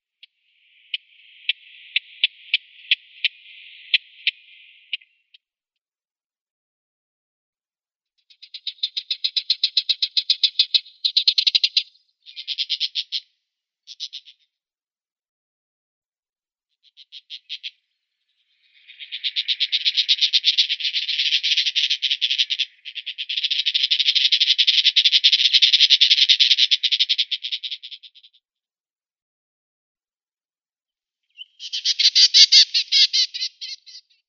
Western Orphean Warbler calls

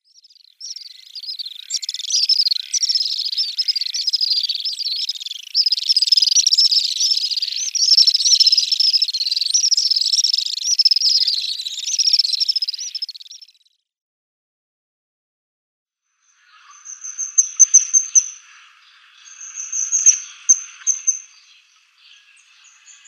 Little Swift

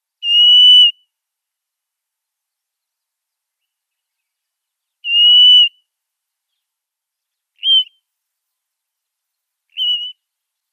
Blue-naped Mousebird calls

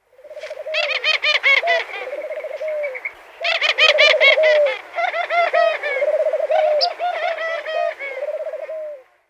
African Collared Dove calls

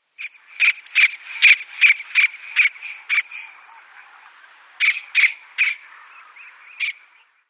White-throated Kingfisher calls

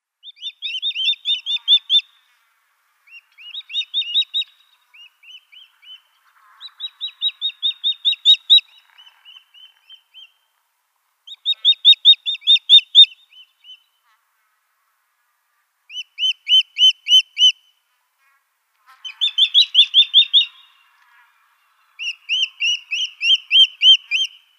Gabar Goshawk pair

